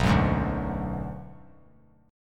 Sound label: B Minor 6th B; Bm6 strummed; JGuitar